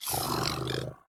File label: Cry; Kauren